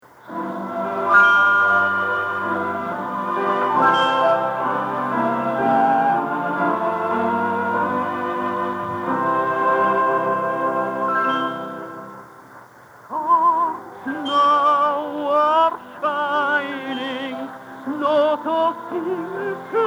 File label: Cradle Song; Ernestine Schumann-Heink; The 1934 Broadcasts